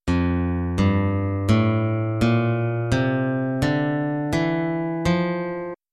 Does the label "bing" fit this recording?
No